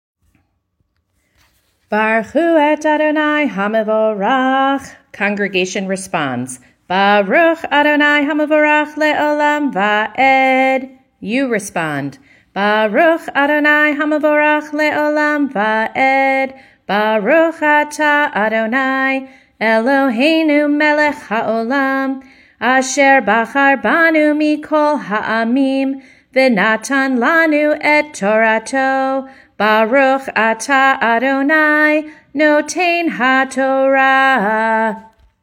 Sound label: Blessing before Torah; bnai mitzvah; barbat mitzvah